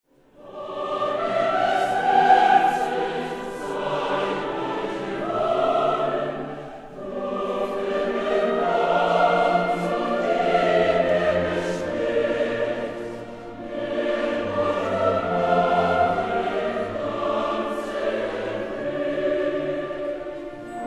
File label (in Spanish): Preludio coro Marcha nupcial; de Lohengrin Wagner; el coro en la opera